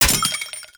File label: Scaldra Grenade Launcher Shell Casing